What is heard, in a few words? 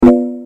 notification